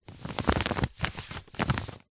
paper sheet on soft surface hand rub over crumple rustle; foley